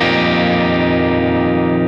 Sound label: D E